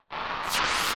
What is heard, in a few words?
Deku Tree Web Snap; zelda; OOT